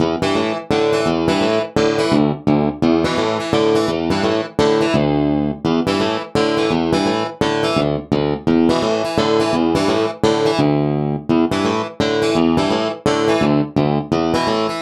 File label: moon echo chorus dry; aas objeq delay modulators